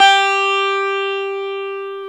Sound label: GTR E12, Dual Guitar; Club-50 - Foundations Roland